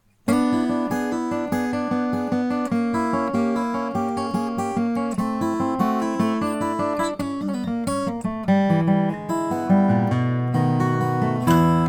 froggy bottom k deluxe curly maple

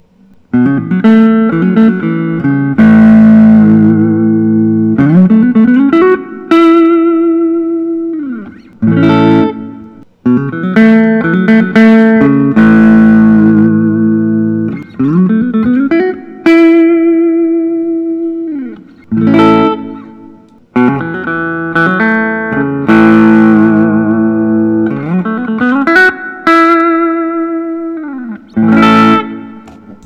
paul reed smith dgt wood library; limited 2010; copperhead; willcutt

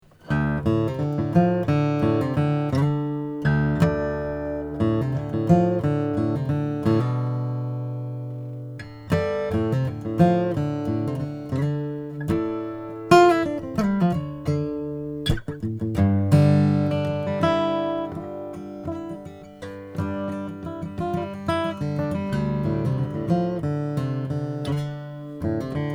craig anderson custom style 2; vintageparaguay; david anderson

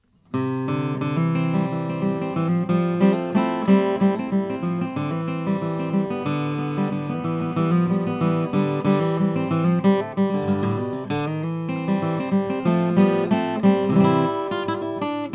thomas rein r1 maple

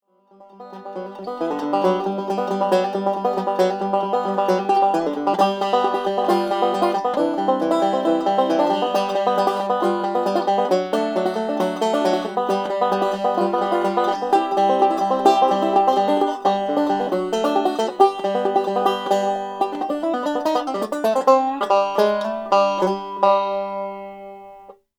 deering deluxe mahogany

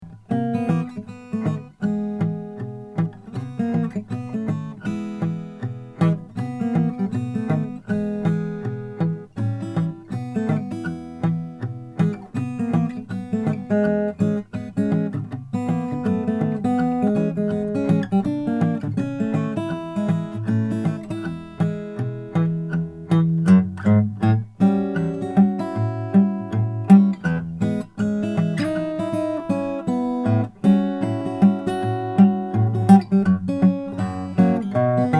fs 3 om madagascar; square deal guitar

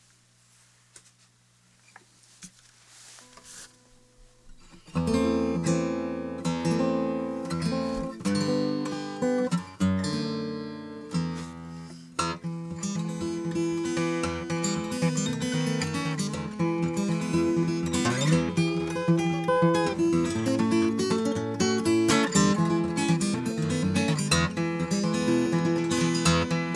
0m 28 authentic natural, guitars; martin

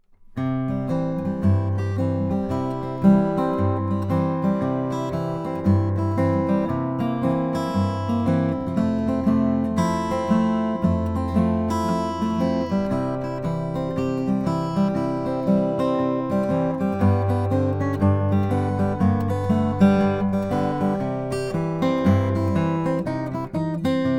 martin d 18 mahogany; 1954

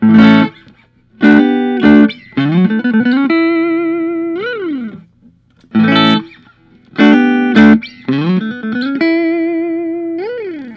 lentz hsl brazilian lemon; custom; with dark back

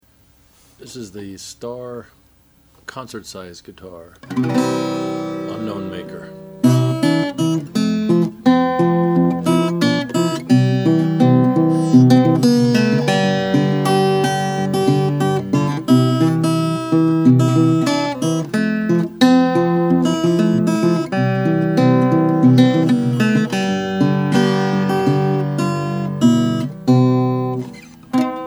regal concertone alamo natural; concert size 1930

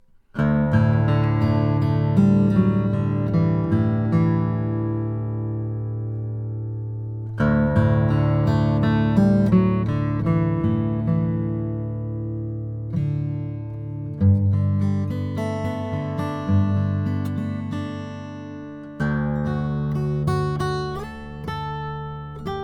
lowden o 35c chechen, Guitars Acoustic; Chechen is a unique and rare tone wood, known for its dense, hard characteristics

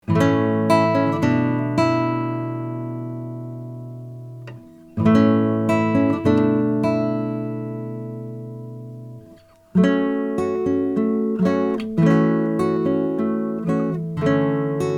brazilian rosewood; langejans

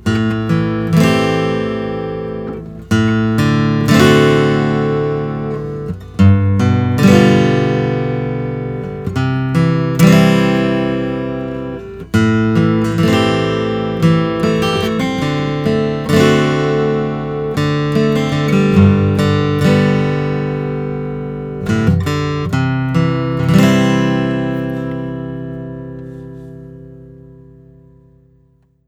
collings ds2ha custom natural

